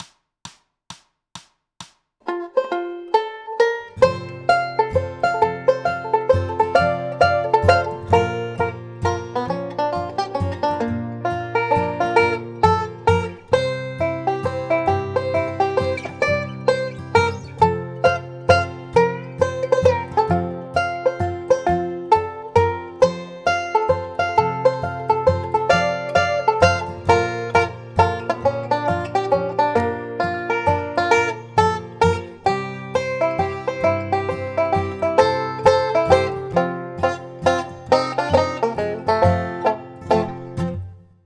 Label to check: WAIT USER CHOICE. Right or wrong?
Wrong